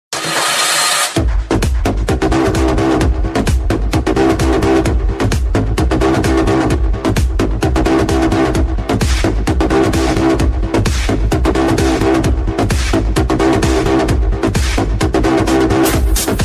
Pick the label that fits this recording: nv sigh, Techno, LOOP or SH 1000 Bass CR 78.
Techno